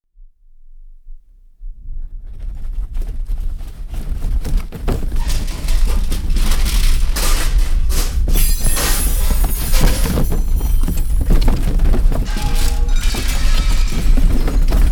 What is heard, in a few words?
Earthquake